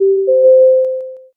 m notify

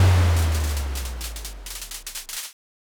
firework burst

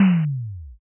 impact text

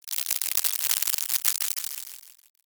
applause

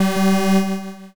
wrong buzzer

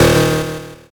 zap

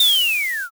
brake

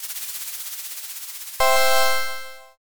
answer roll

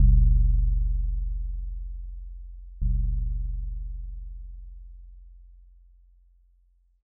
joya bell

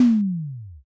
impact don

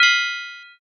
metal title

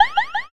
goblin

